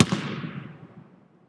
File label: Fieldhouse Basketball